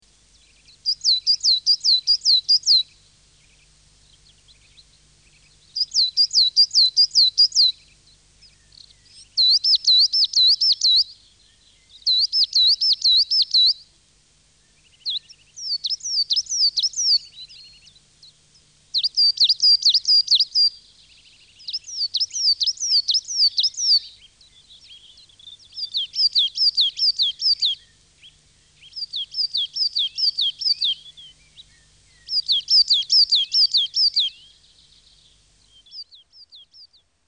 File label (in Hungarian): Fenyvescinege; Madarak